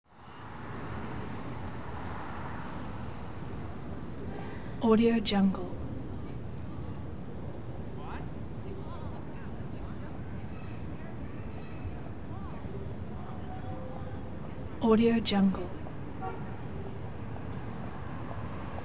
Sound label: car bys in light traffic; hollywoodedge